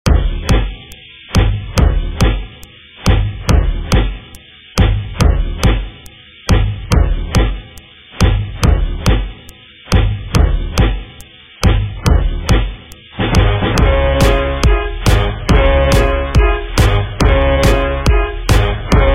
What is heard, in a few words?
50s Grid Redrum 140Bpm